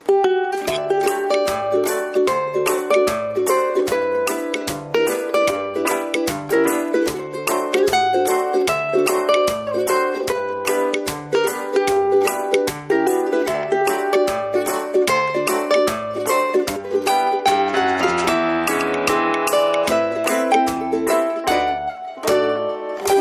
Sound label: Ukulele; LG AX8370 Clout; Ringtones